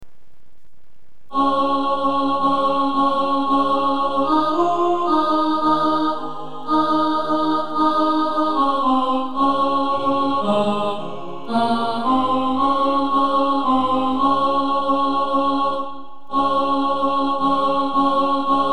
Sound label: alto